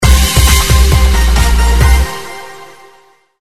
wheel popup trigger

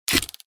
open

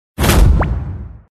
title drop

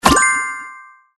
click; moneybank

